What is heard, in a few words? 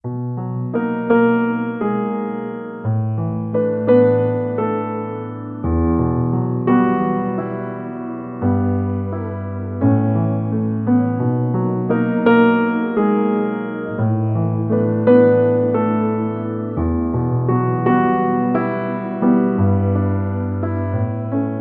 Rain Acoustic Version